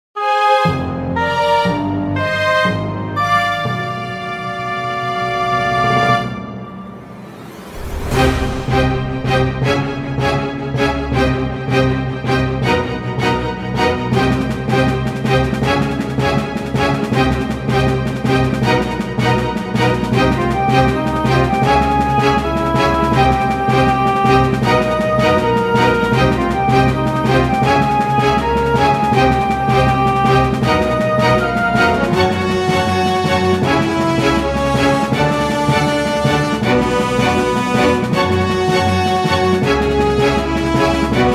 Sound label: escape